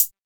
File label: hi hat sound g sharp, Royality free hi-hat sample tuned to the G# note. Loudest frequency: 10887Hz